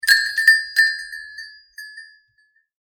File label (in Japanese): ドアチャイム